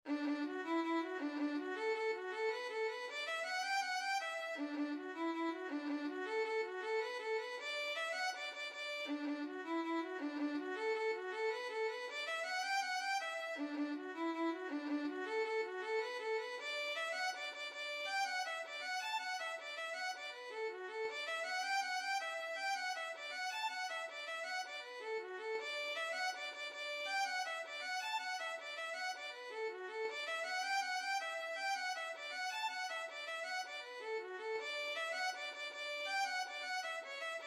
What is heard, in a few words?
violin